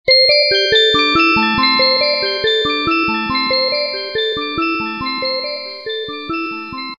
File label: Echo tone; Samsung SGH-F275L